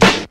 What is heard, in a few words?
bridge, Snares Knocks